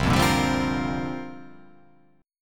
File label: C 9th Suspended 4th C down